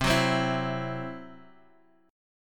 C-Major Flat 5th, Guitar Half Step Down

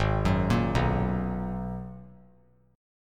G sharp Minor 9th G sharp; G#m9 arpeggiated; JGuitar

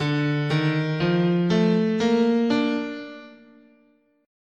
D Balinese Pelog, guitar, scale

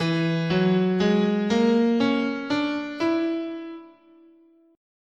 E Prometheus, scale

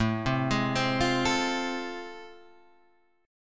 A Minor Major 7th Sharp 5th; AmM7#5 Chord {5 3 6 5 6 5} arpeggiated

